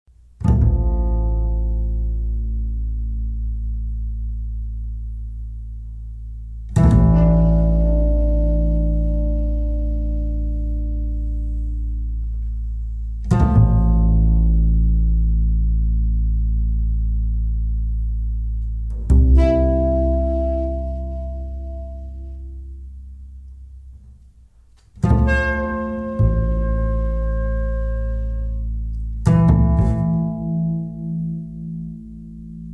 Bells

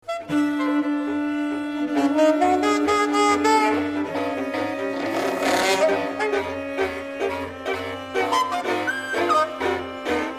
Techno

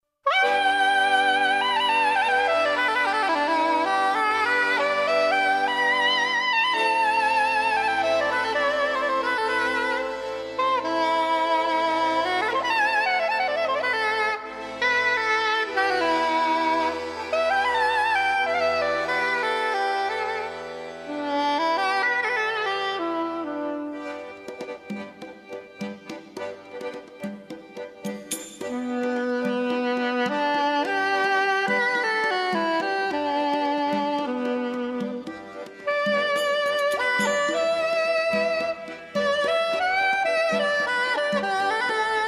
Jazz

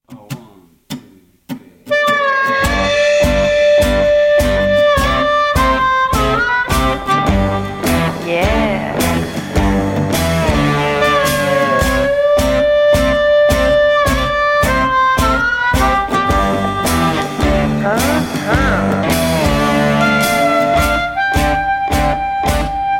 Characteristic Blues

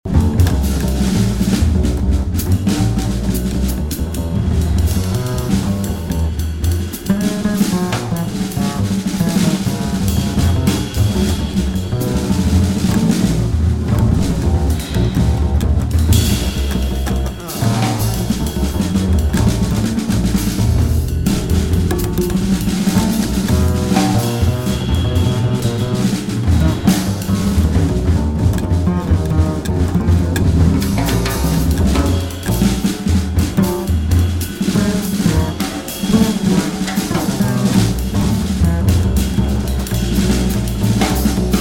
Blues

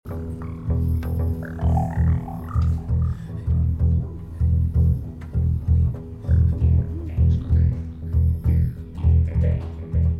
rock

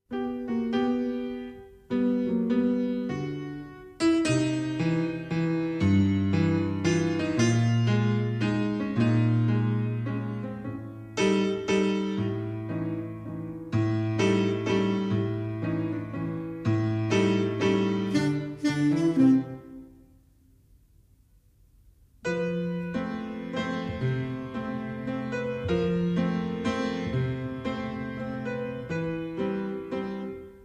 waltz

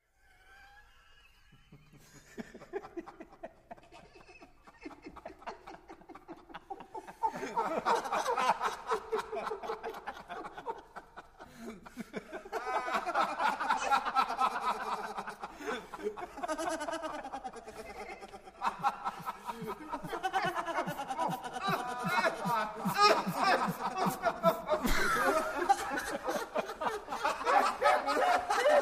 Techno Rigoletto

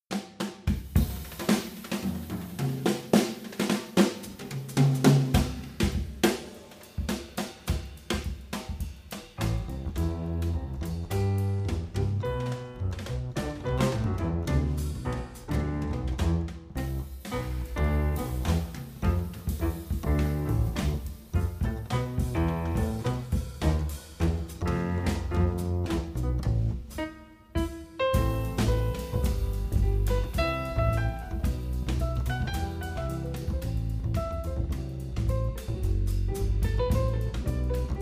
Samba

